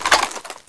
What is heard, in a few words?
m203 open; weapons